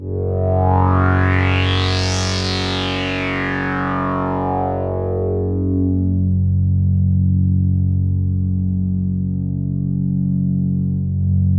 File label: H.SWEEP, BIG SWEEP; Masterbits; Vintage Voltage